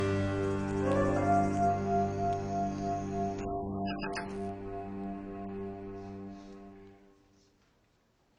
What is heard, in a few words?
organ; familyguy